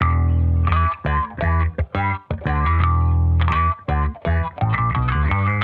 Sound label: Bass Strat Mix 85 E, Guitar Bass Mix, funk soul; 85bpm; J Bass